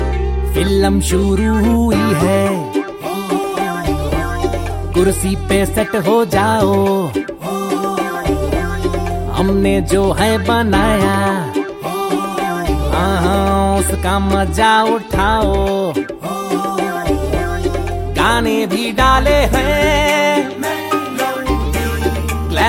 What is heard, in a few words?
Bollywood Song Ringtone